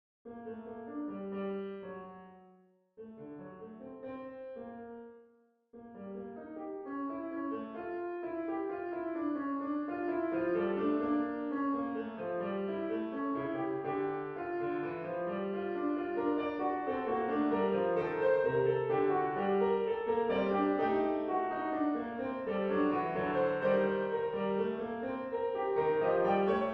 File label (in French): fugue instru